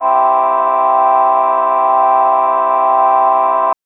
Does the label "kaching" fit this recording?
No